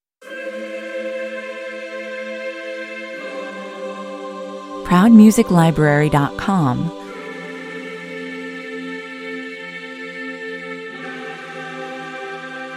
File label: choir only